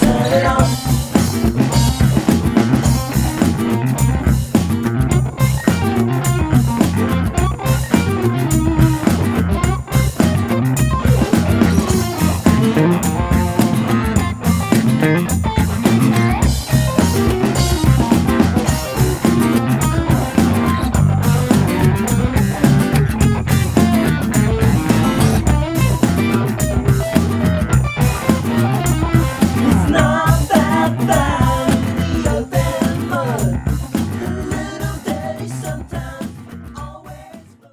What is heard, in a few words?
turnaround solo; lead guitarist